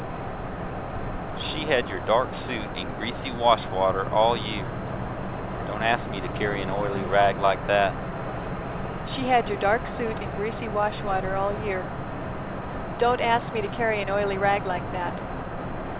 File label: car, noise